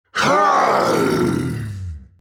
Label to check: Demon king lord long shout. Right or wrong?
Right